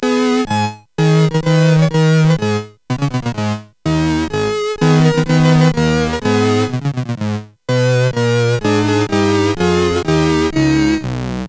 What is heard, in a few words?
sid CSG8580; HVSC; 2 Grenadiere